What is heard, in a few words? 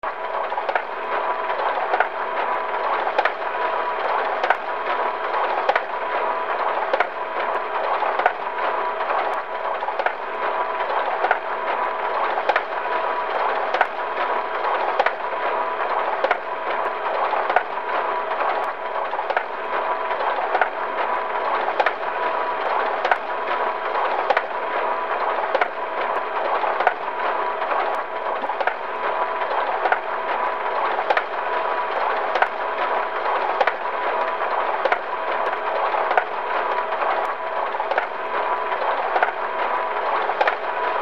printer loop